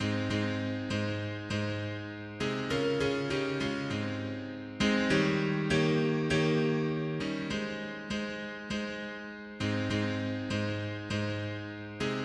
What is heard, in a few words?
mono piano; hymns; Himnario grande